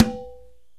PERC KALIMBA

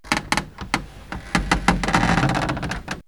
Creaky Wood; X-Static Goldmine

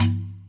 perc, Percussion; Trance Explosion Vol1

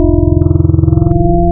form, wavetables, Classic Synths

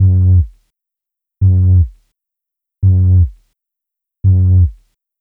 Bass, Trip-Hop Loops; Sonic Foundry (Sony Creative Software); Rondo Brothers

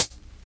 Hhat, Hihat; Equipped Music; Loungin House

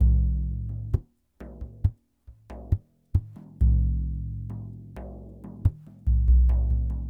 Lg Frame, World Percussion

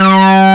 SYNCHRO; East West - Global Sounds; THE CAT

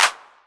808 Clap; Drums; Novation Drumstation

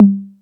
Beat Box, E-MU Pro-Cussion; drum machine

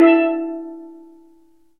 steel drum; E-MU Producer Series Vol. 2; More Studio Essentials; Composer

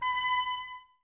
OBOES B; Miroslav Vitous

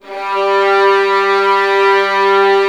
Virtuoso Strings

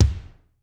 ROOM KITS; Crypton; DrumBase5000

